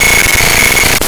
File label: Rhythm